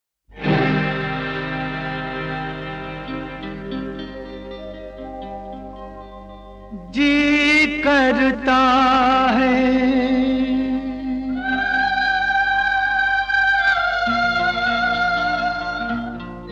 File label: Solo; yaadon ki zanjeer 1984